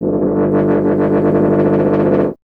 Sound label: BRS TUBA, Solo Instruments